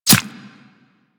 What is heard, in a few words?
Player Arrow Impact Flesh; Warframe; Weapons